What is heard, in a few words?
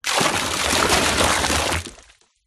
nature water pour into deep water